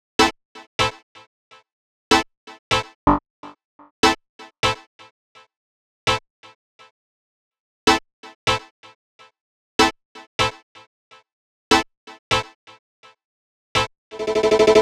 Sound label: 125 G Synth, Complex Electro Synths; Prime Loops; Explorations